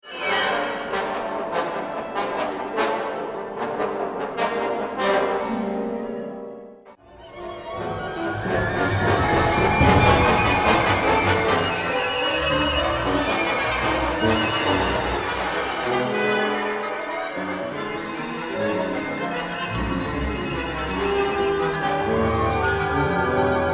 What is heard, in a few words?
BRASS; REY